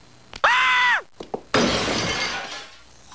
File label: Scream, crash; Homer